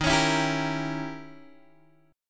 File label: D sharp 7th Flat 9th, Guitar, Open G